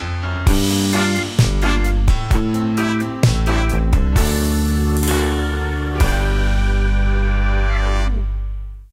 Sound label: Stinger Scanner Ready